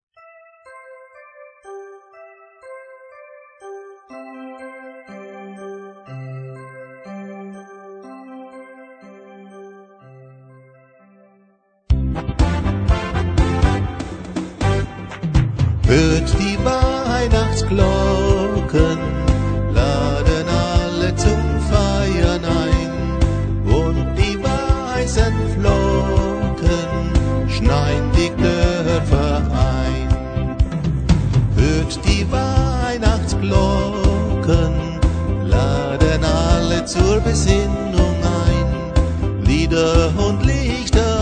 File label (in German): Hört die Weihnachtsglocken